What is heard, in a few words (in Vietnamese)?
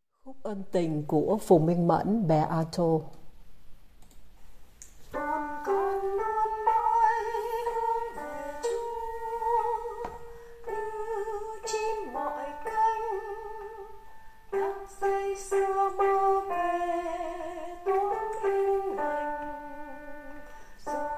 Khuc An Tinh alto; ca doan teresa